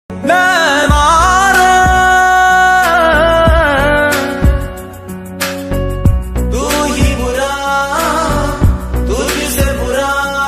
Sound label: hindi ringtone